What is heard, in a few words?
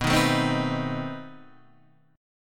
B 7th Flat 9th B Guitar Open D